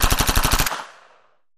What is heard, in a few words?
SUBMACHINE GUN BURST; bursts